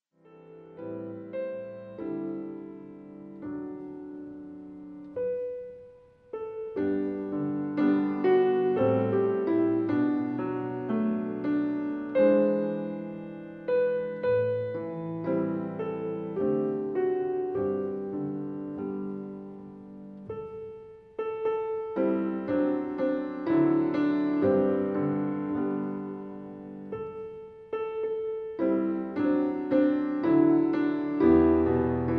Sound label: Jesus Savior Pilot Me Piano